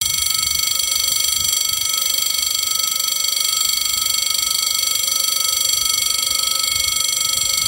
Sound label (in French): SNCF Sonnerie